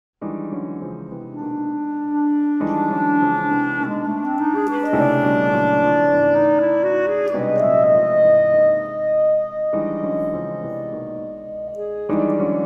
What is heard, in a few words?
clarinet